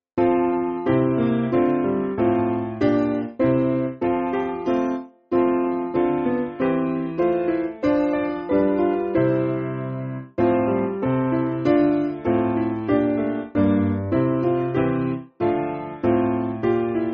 Piano, Free Music Backing Files for Churches; Wraysbury

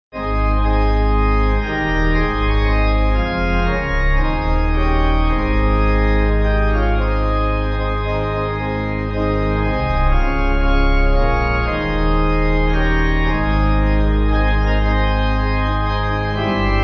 Organ; All Hail The Power

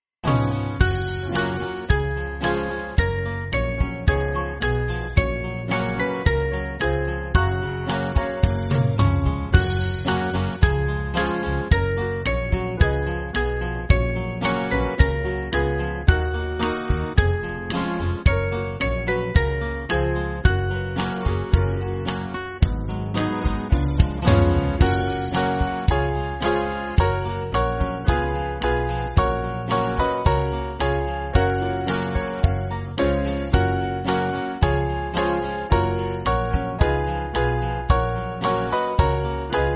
Lord Jesus From, band

